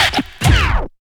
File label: Stab; Zero-G Jungle Warfare 1, 2 & 3